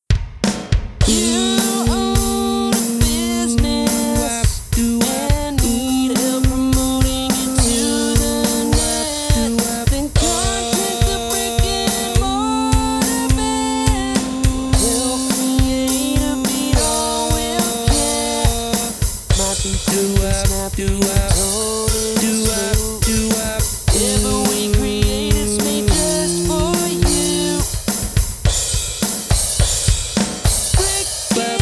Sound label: vocals harmony high